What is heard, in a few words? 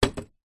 foley metal chair tube small set down concrete